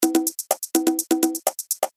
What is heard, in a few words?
80s Perc Loop 125 bpm